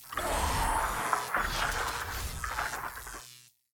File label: idle, monster; elimental; lake